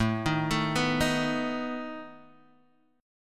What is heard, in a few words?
A Suspended 2nd Flat 5th, Guitar, Open G